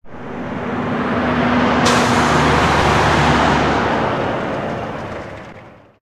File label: car passby; Source Engine; tf; mvm warehouse